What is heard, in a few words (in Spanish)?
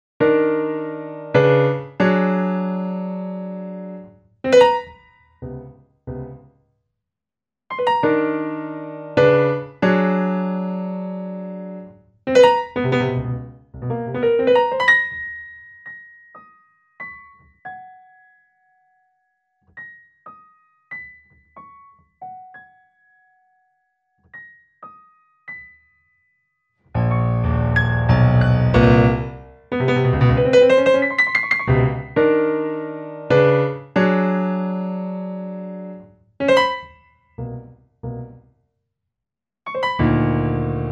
piezas para piano; 5 piezas para piano